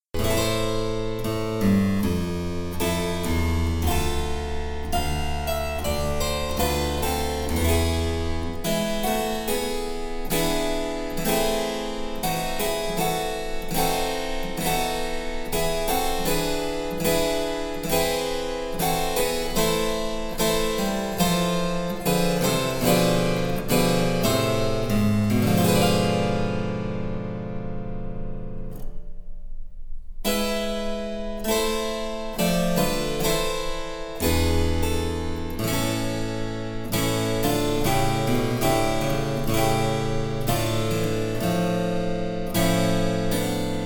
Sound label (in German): Cembalo; Mark Lindley WTC 1